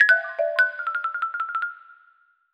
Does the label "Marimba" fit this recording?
Yes